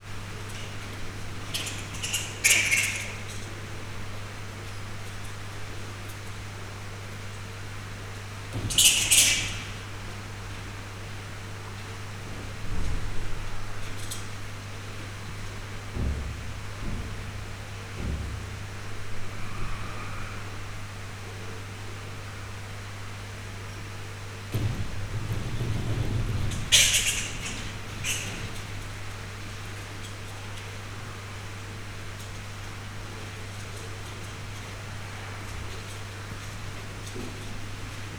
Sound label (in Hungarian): indiai repülőkutya; nyiregyhazi zoo 2010